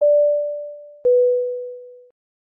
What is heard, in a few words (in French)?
Avion Ceinture